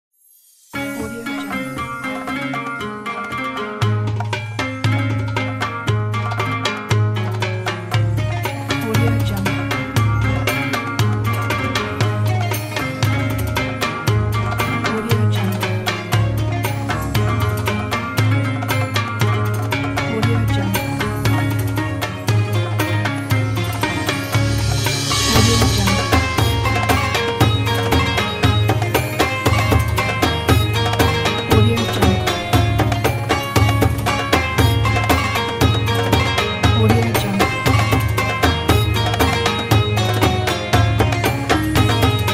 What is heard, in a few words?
ramadan traditional theme